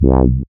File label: SYN MC WAH, Roland MC-202